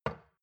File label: shot glass place table soft; soundpool